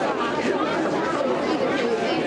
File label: Ambi, indoor